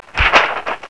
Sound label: Open Blueprint; Blueprint 1.0